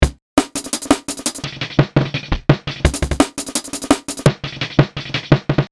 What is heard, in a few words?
drums